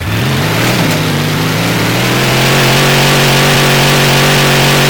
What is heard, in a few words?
1st gear no shift, truck; brrr truck; sentry; manster